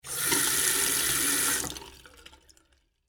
Water Sink Faucet On Run Off Short, bathroom; Bathroom Sound Effects; Bathroom (Sound Effects:Household); SFX Bible 6

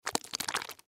Lens Cleaner Shake Liquid Slosh Plastic Bottle; Foley; Cleaning; Blastwave

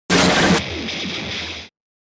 fast attack; Fast Attack Hiss Harsh Blip; Warped; Blastwave

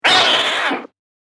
Cat Screech Hardcore; Sound Effects:Animals

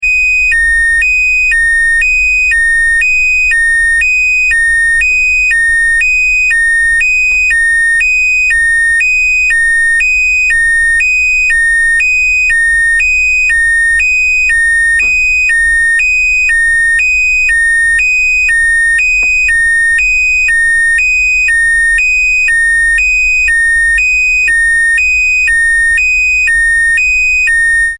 Alarm Electric Alternating Tone - Bleeping; Alarms; Electronics

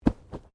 Bodymovement Fall Light

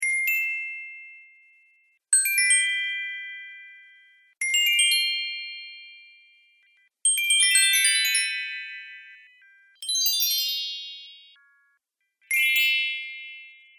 Message Notifications; Musical

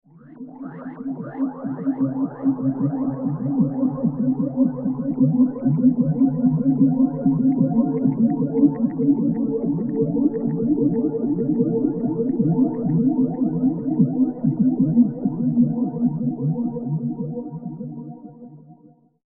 Onboard The Alien Cruiser, spacecraft

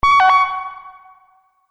Multimedia Event Notification, beep sound effect; Beep Sound Effects

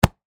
Punch Boxing, Training, Glove, Hit; Sports; Boxing Sound Effects; BlastWave

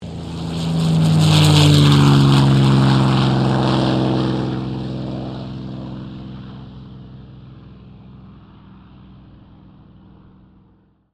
AIRCRAFT CA-18 MUSTANG TAKEOFF; P51 /CAC-18 Mustang; Airplane Sound Effects; Airplanes (Sound Effects:Vehicles)

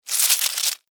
Beetle, Scurry, Run, Stop, Insect, Bug; Animals:Insects; Blastwave

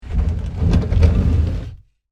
Scrape Slide Wood, On, Wood, Surface; Texture; BlastWave

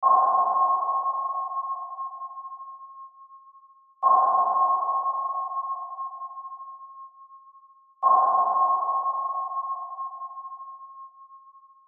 Submarine Sonar Ping Multiple; Military; Warfare; Miscellaneous Combat; Blastwave

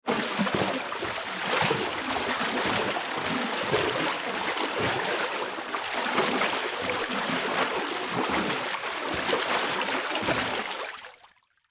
Alligator Roll With Prey Splash Water Movement; Animal; Alligator Sound Effects

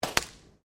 GUN TOY DART GLASS HIT

